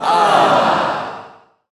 audience sad; HeavenStudio; quiz Show

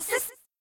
rah Rah Sis Boom Ba Boom girls; cheer Readers

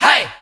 crowd hai jp; HeavenStudio; fan Club

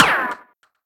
long Pull End; HeavenStudio; rhythm Tweezers

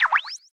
comm start; HeavenStudio; shoot Em Up

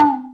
beat change; HeavenStudio; totem Climb

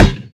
ball hit; HeavenStudio; space Soccer

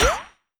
NPC small ball; HeavenStudio; working Dough

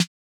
practice snare; HeavenStudio; charging Chicken

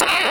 long pull; HeavenStudio; rhythm Tweezers

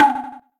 short appear; HeavenStudio; rhythm Tweezers

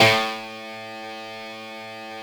glee Club, strings; Rockers

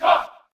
face Turn Other; HeavenStudio; marchingOrders

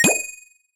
result Medal Get; HeavenStudio; rankings

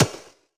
step; HeavenStudio; Tap Troupe

